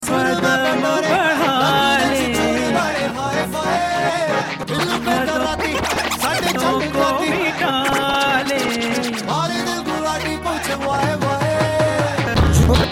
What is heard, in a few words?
Song Mixture